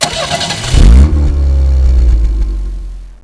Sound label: cars; quakerepo fortress items